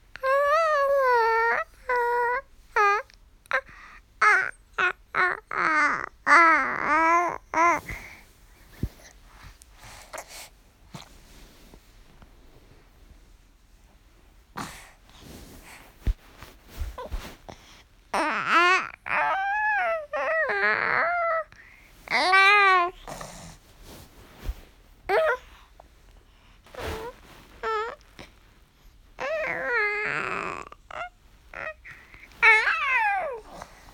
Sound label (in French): reveil